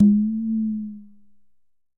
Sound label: Tumba C Slide, Conga; Latin Percussion; Drums; Drum Hits; Ableton; Factory Packs